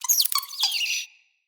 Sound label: Misc Squeaks, Animals, Vocals; Floppet